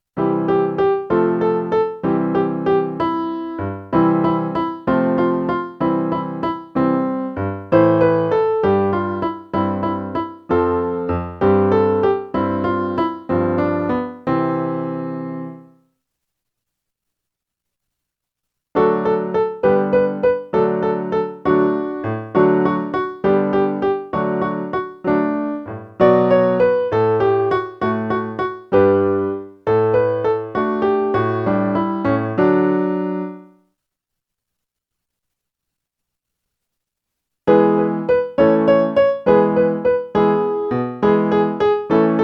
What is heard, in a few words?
Eighth Triplets; 8th-Note Triplets